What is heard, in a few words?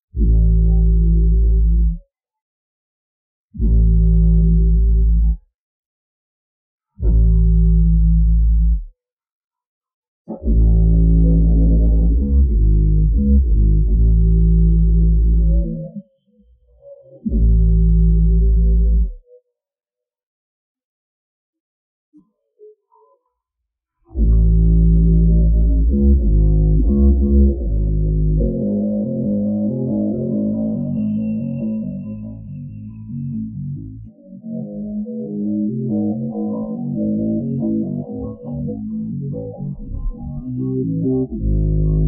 bass, Stem